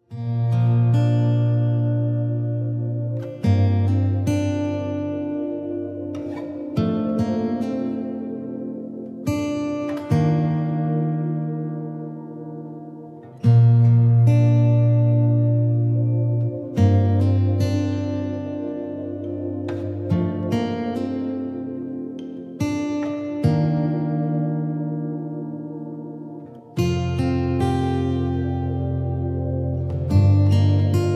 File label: Instrumental